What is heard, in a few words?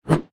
Leap; Joblin